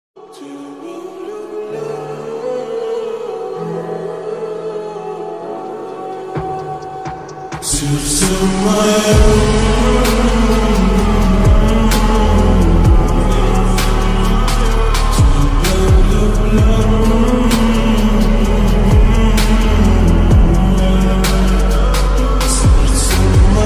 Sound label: slowed reverb ringtone